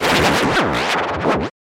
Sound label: stylized glitch sound; soundscrate